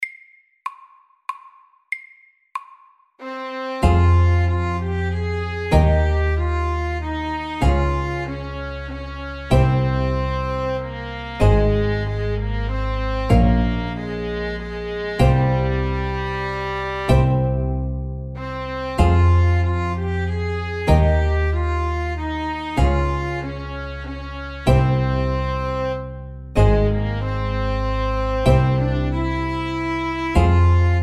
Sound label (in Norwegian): Folketone FAST 95